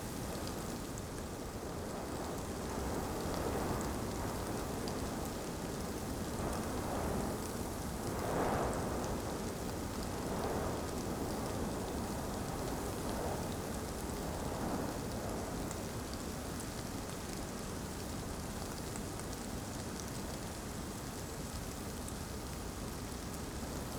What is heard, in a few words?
ambience desert day breeze drizzle; Rust